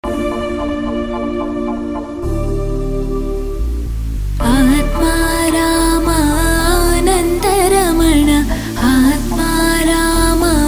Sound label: Female Version